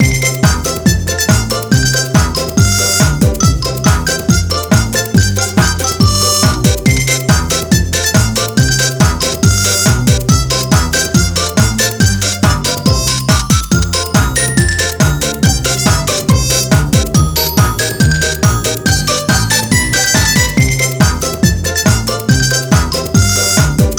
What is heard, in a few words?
French - music, Rock Climber Music